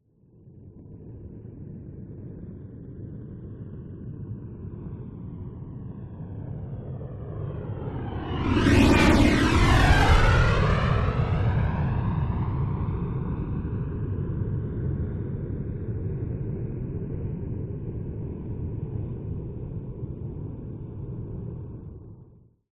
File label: JET MILITARY A-10 Thunderbolt Flyby Right To Left Close; TRANSPORTATION